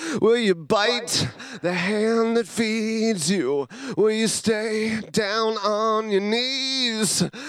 lead vox

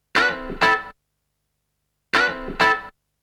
Guitar

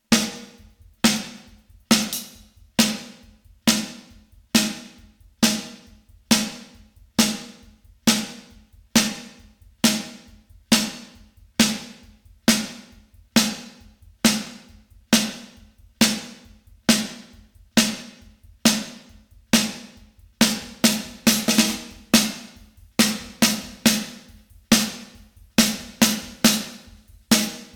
drums

